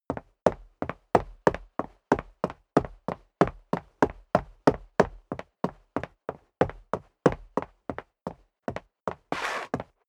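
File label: step dress boots concrete walk sequence, footsteps; schritte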